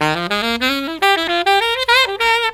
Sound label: SAX, Killer Brass Riffs